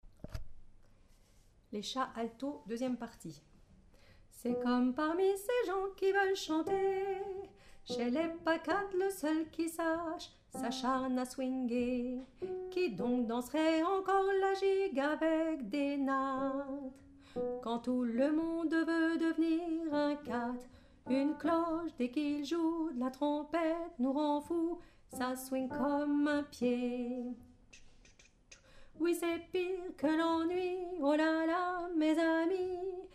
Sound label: Alto